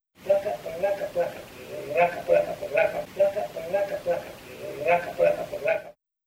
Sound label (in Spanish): Ritmo de baile corrido; Isidoro Recio Veganzones